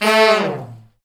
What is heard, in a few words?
BRS Fat Section, Fat Falls; Roland LCDP06 Brass Sections; Section FX